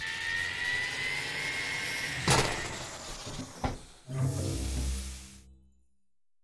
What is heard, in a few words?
Steam Machine Failure; adams venture origins